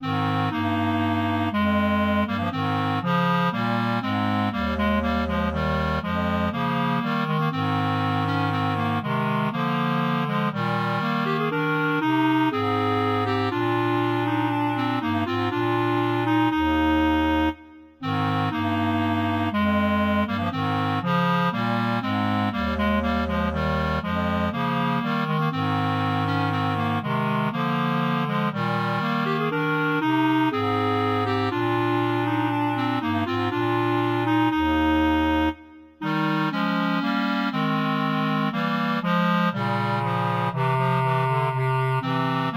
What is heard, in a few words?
sarabande for bass clarinet trio; gabrieli andrea